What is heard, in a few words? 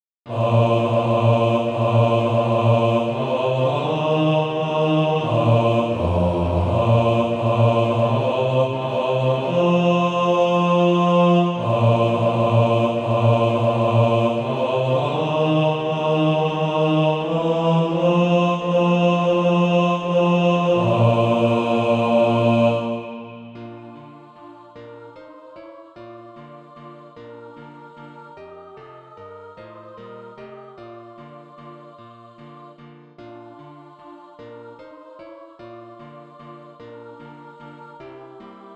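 bass, Practise Music (SATB)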